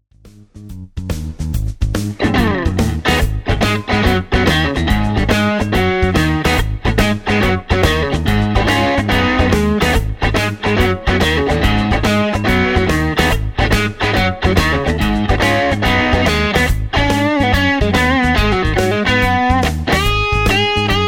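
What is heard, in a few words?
crunch mid, guitar pickups; schwarzbach; VP-Strat-IrishTour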